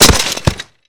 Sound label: Body Falls Heavy Glas